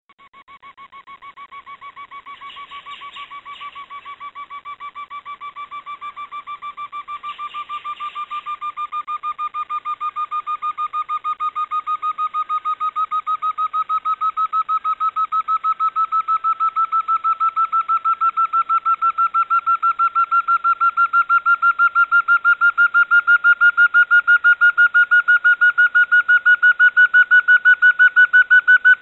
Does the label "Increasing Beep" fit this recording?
Yes